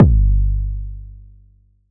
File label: Kick Low Long